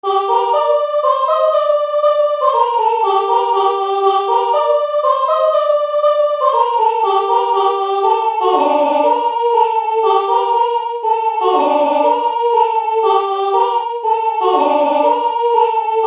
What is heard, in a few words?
soprane